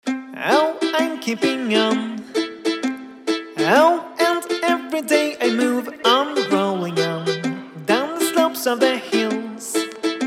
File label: chord conversation